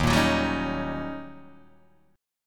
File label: Eb 7th Sharp 9th, Eb, Guitar, Open D